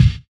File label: BDRUM; E-Lab; X Static Goldmine 2